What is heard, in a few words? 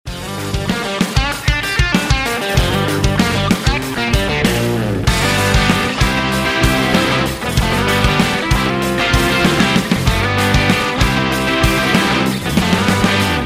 instrumental